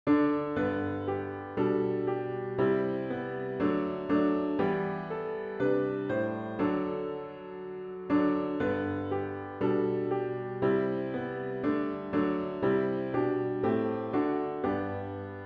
piano only

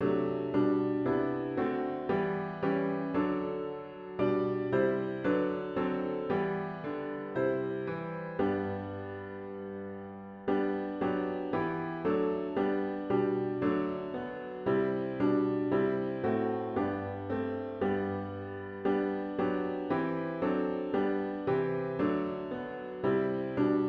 Gentle Mary Laid Her Child piano with Intro; worship service

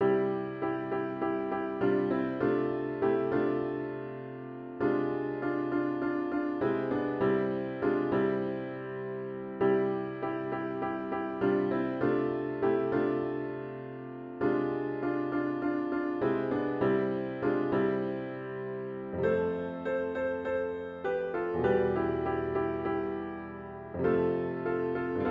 piano only

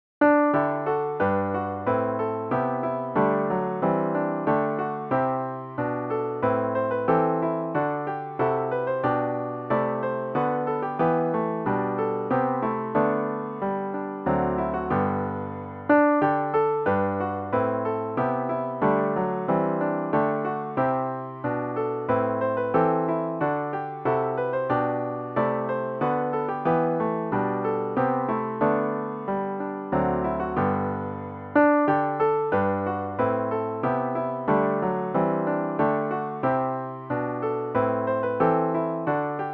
piano only; As Trees from Tiny Seeds Can Grow

